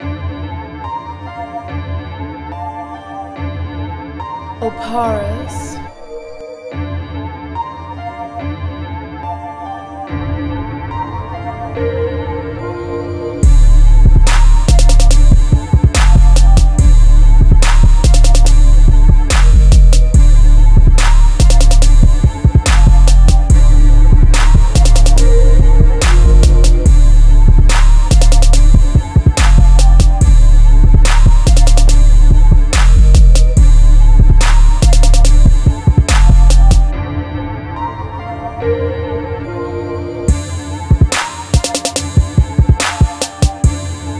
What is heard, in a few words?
71.5 BPM, instrumental